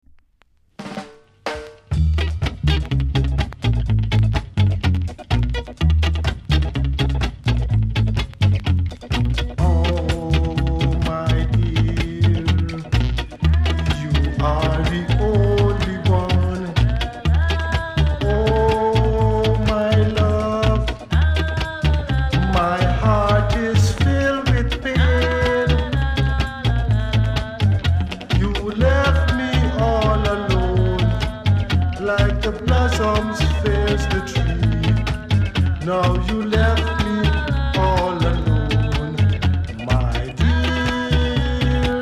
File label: FUNKY REGGAE; ska 2014